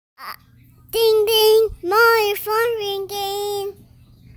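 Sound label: Aussie phone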